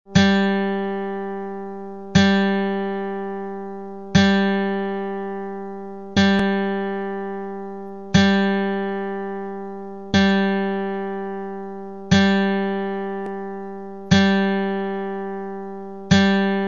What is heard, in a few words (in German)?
4 Stimmen G Stahlsaite